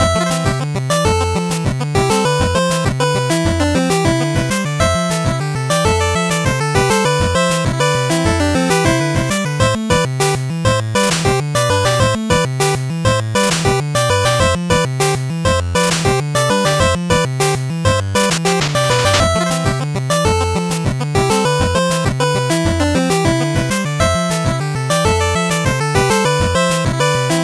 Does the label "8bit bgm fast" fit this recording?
Yes